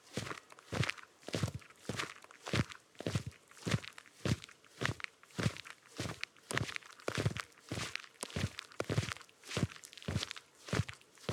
Stereo Walk Seamless Loop, STEPS Dirt & Gravel

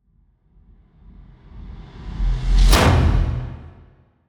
Cinematic Whoosh Hit; Whoosh And Push; CB Sound Design

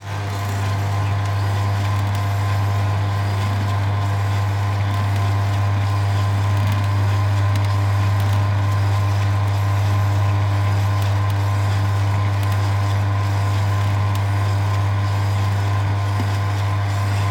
juice machine running base; Kitchenware; 3maze

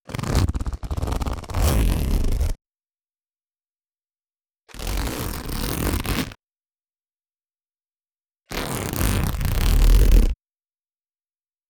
Small Backpack plastic zipper - slow zips; Mindful Audio; Elements

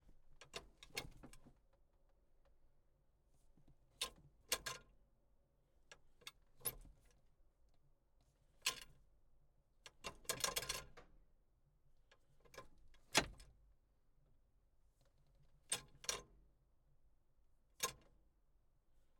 Ford Tractor - Gear Stick, Ford 7700; 1979; Pole Position Production

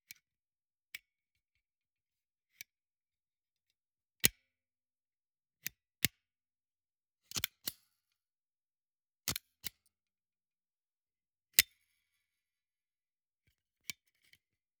Mech - stappler, Metal Sweeteners; aXLsound

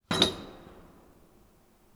Metal Pipe; 99Sounds; The Warehouse

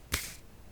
card Shove, Casino; Kenney's Sound Pack

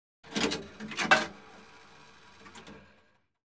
BT Payphones, Dial, Money Inse; Computers Printers Phones; BBC Sound Effects Library

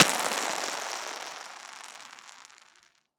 Ice Impact Debris Heavy; Soundopolis; Icy Snow

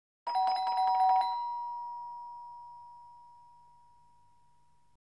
Doorbell, Ding-Dong Type, Multi; Household; BBC Sound Effects Library

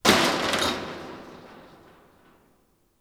Metal Fence Strike; 99Sounds; The Warehouse

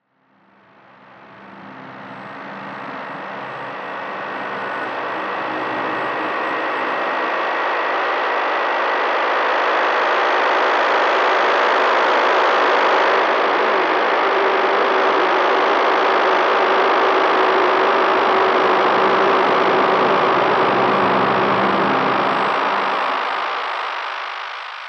Atmospheric; 99Sounds; The Weird Side Samples

